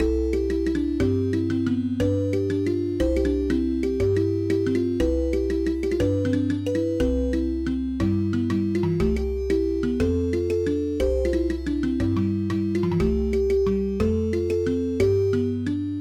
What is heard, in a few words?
musunde africa